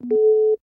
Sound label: beep pager; Kerbal Space Program; Chatterer; beeps